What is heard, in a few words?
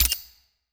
Chat Select; Custom UI Sounds; Call of Duty Black Ops 2